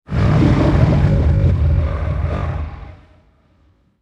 sea serpent roar moan growl

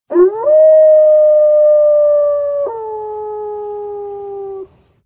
wolf howling sound effect

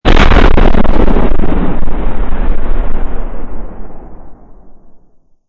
large explosion

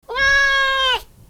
cat meowing type

